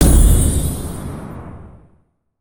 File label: Teams Join Team; aronwk collection; ndaudio